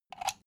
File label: socket pull plug